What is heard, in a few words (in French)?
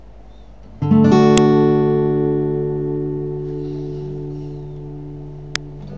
Accord RE, guitare